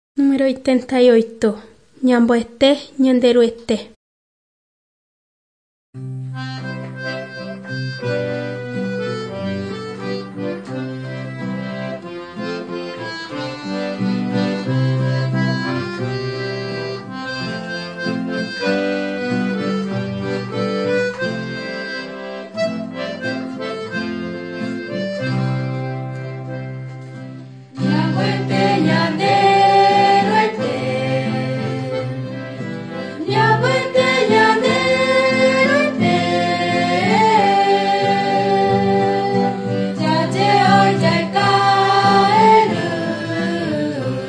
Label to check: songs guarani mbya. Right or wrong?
Right